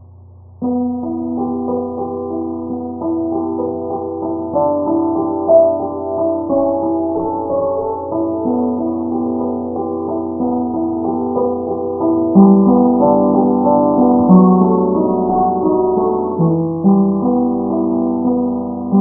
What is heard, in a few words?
piano point